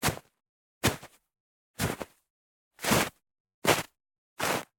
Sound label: snow impact stomp hard; sonniss gdc